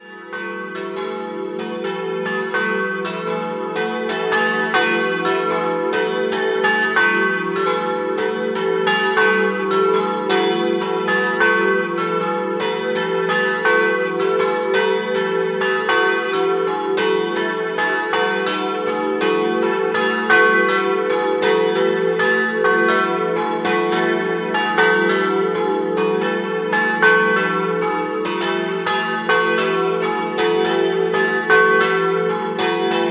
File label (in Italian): TORSA, campane